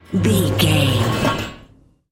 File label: manhole metal slide door open close